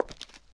Hard Step, Footsteps

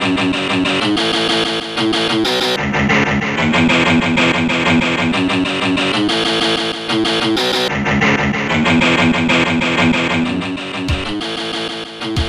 shortrock, rock heavy; Digital Symphony Collection

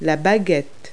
stick; Multi-Lingual Talking Picture Dictionary

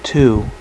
Male; NUMBERS

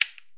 Switch Small Plastic; Road Trip Press Kit

